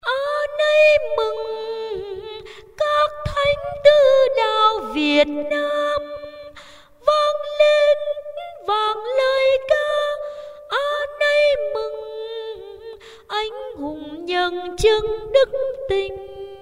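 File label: soprano tenor high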